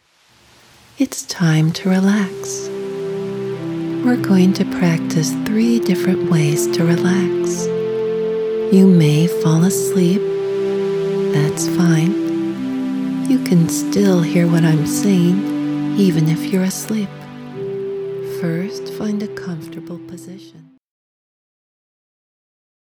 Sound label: Calm Breathing